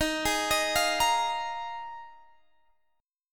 Eb Suspended 2nd Suspended 4th, Guitar Half Step Down